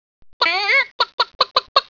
chicken; animals

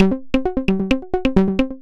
808 Loop Perc 132, Roland 808 Drum Loops, 132bpm

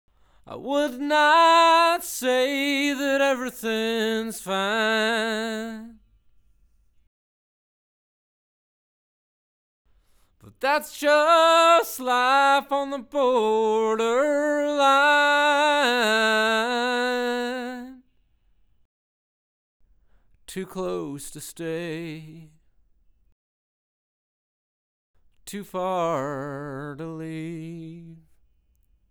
Lead Vox

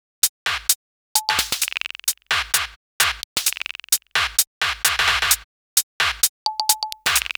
Club Dance Beat

